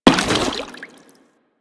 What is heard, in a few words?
Crewman Step Water, Footsteps; Warframe; Corpus